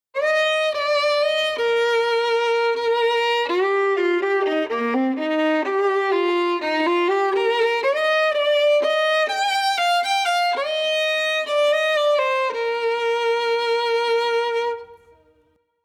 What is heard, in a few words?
Violin